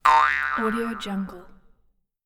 boing; صدای بینگ

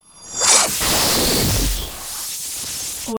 scifi whoosh by heavy whoosh; transitions and movement

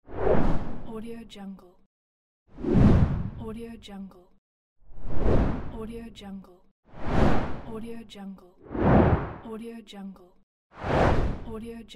whoosh; ووش باد; nature sounds

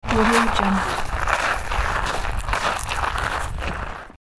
walking on gravel; راه رفتن روی شن

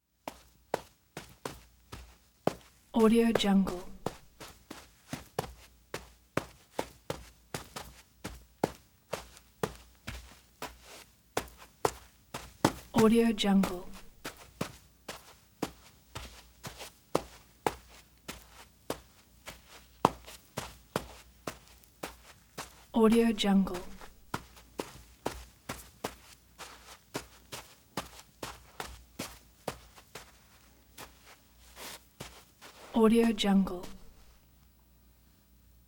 volleyball feet sand; human sounds

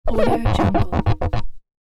foley cartoon glass wiper funny comedy; domestic sounds

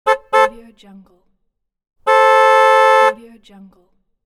car horn; بوق ماشین سواری; urban sounds

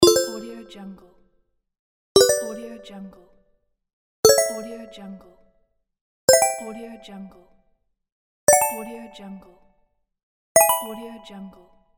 small correct; game sounds

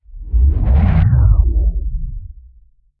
whoosh; transitions and movement

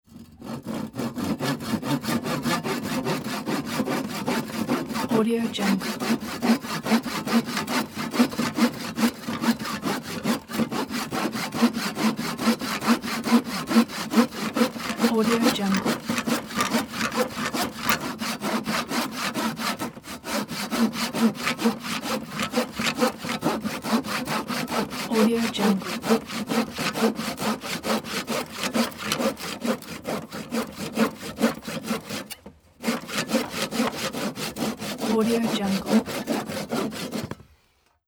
hand saw wood cutting; urban sounds

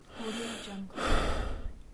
male breathing loop; human sounds

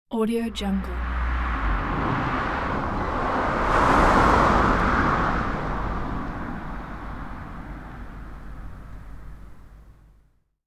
humming car pass by

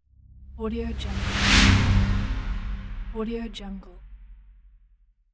terror whoosh; transitions and movement

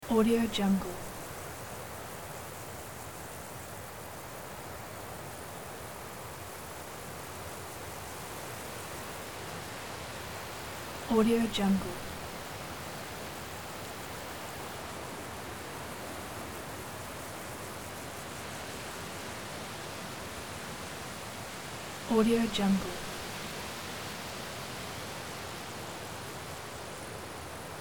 forest valley river stream wind insects

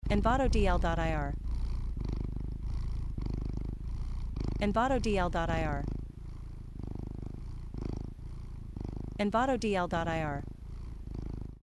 Cat Purring; خرخر کردن گربه; nature sounds

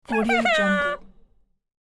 cute baby dino inpatient cry; cartoon; cartoon sounds

